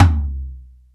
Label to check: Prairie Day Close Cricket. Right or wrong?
Wrong